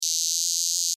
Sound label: Cicada, Buzz, Single, Vocalization, Insect, Bug; Cicadas; Sound Effects:Animals:Insects; Blastwave